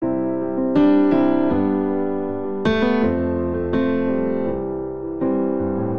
Progression Rock Ballad I - V - IV - I C Major 80 bpm, Grand Piano; MIDI Clips; Ableton; Factory Packs